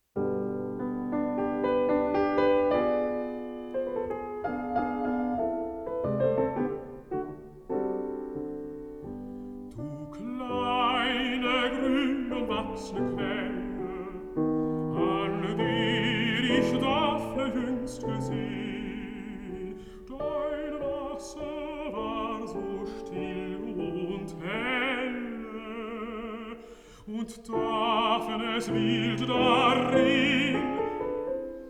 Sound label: song for voice & piano